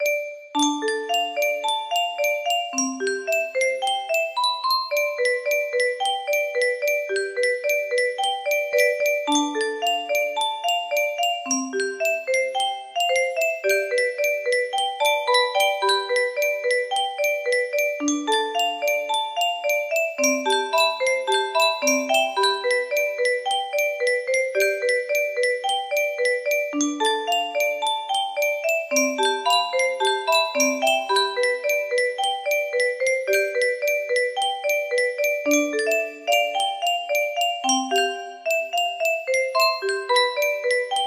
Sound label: 15 notes, music box melody